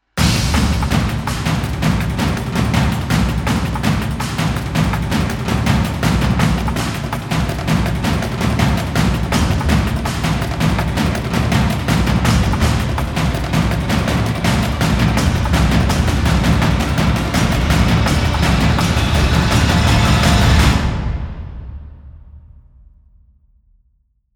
Riser, Percussion; Relentless Percussion; Gothic Storm Music

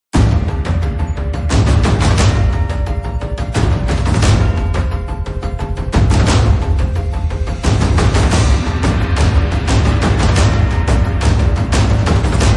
Drums Only

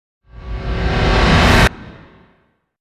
Rang Swell Tonal; Ninja Tracks

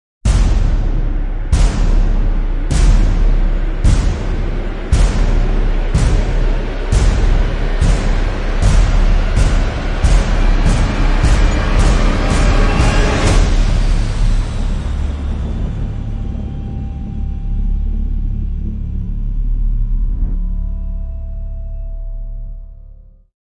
Rising Pulse; Epic Tools vol. 1

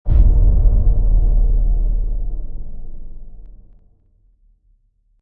Omen Hit Sub

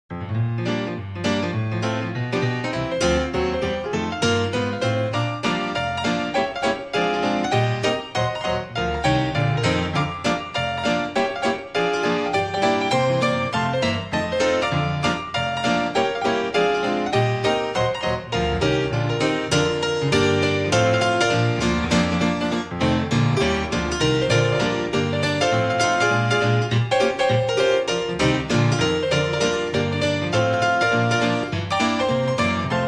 Better Hurry Up, Old Time Gospel Piano

